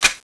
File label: change, weapons; cstrike